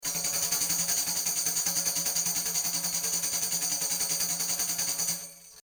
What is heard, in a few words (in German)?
Sennheiser MKH40 Tambourin 50cm UFX 90Grad